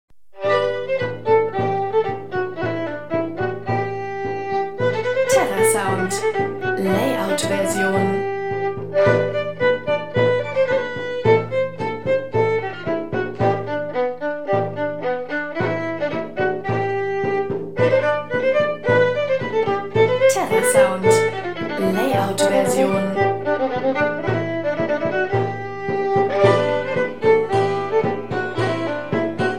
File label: jig; terrasound